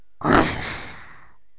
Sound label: bull attack; voices